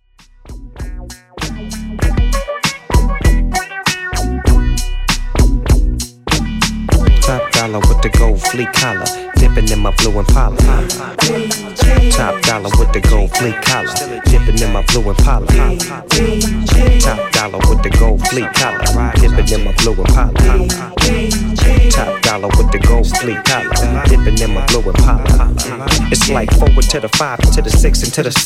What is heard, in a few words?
Hiphop Intro Outro